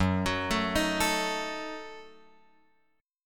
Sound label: F sharp Minor 6th F sharp; F#m6 Chord {2 X 4 2 4 5} arpeggiated